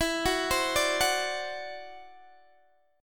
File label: E 7th Suspended 2nd Sharp 5th, E, down, Guitar, DADGAD